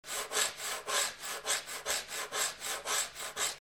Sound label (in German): mikro nahe finger tisch